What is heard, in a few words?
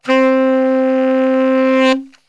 C 2 SAX, Brass Super Section; Best Service